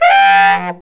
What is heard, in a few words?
SAX Baritone SAX, FX